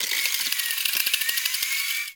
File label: Cave Hi Tech Clicks; Warframe; Ambience; Stalker